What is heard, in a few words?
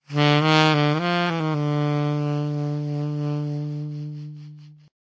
090 breathy jazz sax; HORN LOOPS